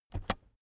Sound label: keyboard external space press fast soft